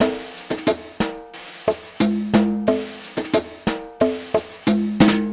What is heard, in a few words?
Jazzy; Сэмплы ударных (Легкая музыка); 90BPM; Beat; Drums; Easy Listening; loop; Ударные